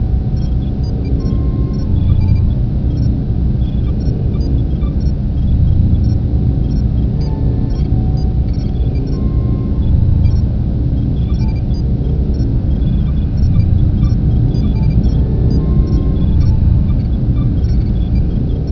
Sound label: craft ambience